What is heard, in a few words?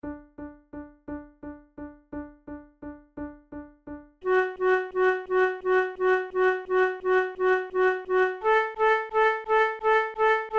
boieldieu le petit chaperon rouge laurette duet, flute